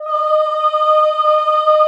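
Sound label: choir E flat 5, Ooh; Sound & Vision - Gigapack I CD 2 (Roland)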